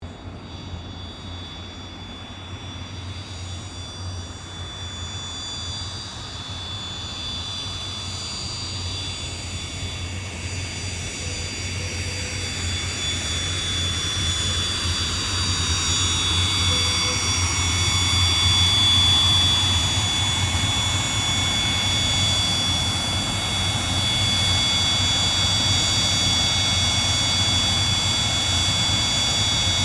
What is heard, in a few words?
AIRCRAFT CA-27 SABRE TAXI IDLE SHUTDOWN; CA-27/F-86 Sabre; Airplanes; Vehicles